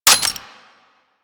Lever Action Pistol Mech; Grineer

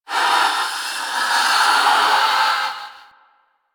Minion Vocal; Warframe; Stalker Acolyte Minion

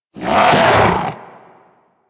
Vocal Attack Short, Hunting Critters; Warframe; Vulpaphyla

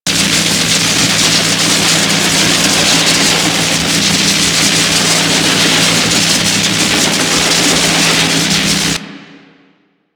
Fortress Energy Machine

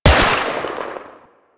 Skiff Rifle Fire; Warframe; Eidolon

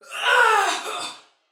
Death, Female, Soldier; Dialog; Barks; Battle; Sinecure

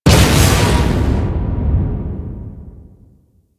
Asteroid Thruster Explosion; Warframe; Railjack

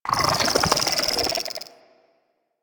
Infested Fishing Fish Caught; Warframe; Infested Fishing Spear

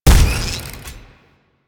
Shot Fire; Warframe; Afuris Prime

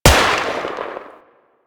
Skiff Rifle Fire; Warframe; Eidolon

